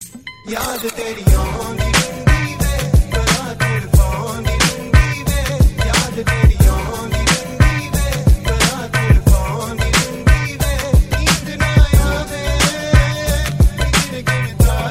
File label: punjabi ringtone